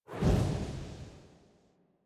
spin Button Click; netent

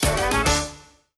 win Medium; netent

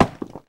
Reelstop Stone; netent

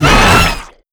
Grunt Hit Heavy; netent

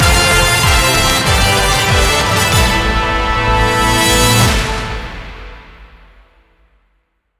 epic win end; netent

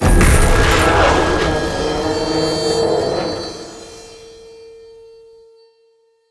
large Win; netent

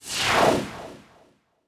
symbol In; netent

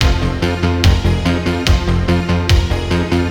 freespin outro loop; netent

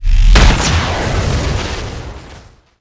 stone Fire Start; netent

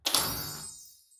cash stack spins left; netent

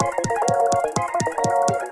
music loop paytable; netent

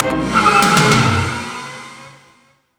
hotline freespin intro continue; netent

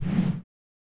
slam hit start; netent

